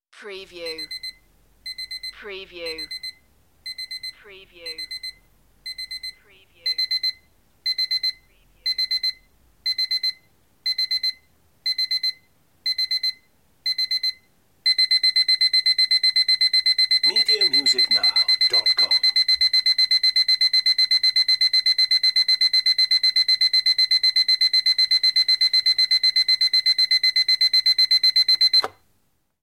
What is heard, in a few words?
Alarm Clock